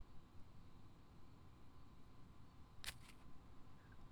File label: dropping stuff on grass